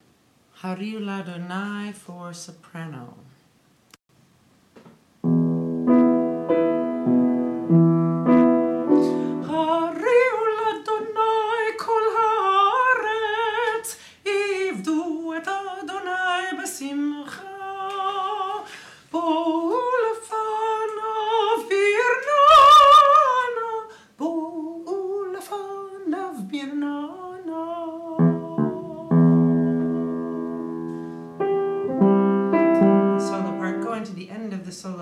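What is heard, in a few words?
soprano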